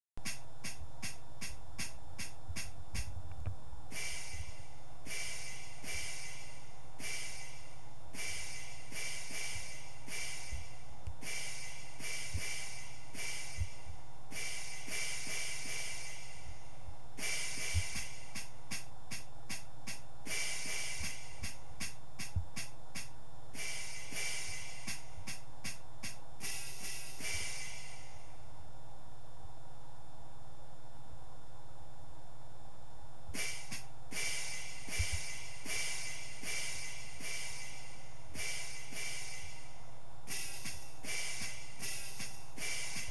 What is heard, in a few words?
cymbals